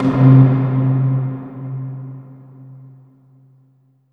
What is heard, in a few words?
hollow pip, amb. mallets; Spectrasonic Distorted Reality 1